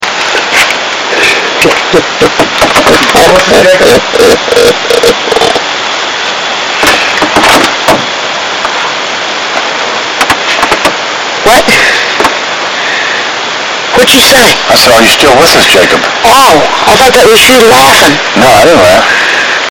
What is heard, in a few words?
ghost laughing; evp